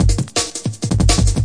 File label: 165 amen var; 161 to 180 bpm